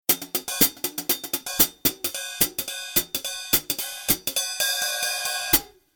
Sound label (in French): son charleston; Percu; Duperrex